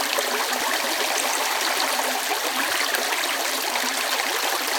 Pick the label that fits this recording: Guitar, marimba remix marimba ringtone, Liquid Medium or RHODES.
Liquid Medium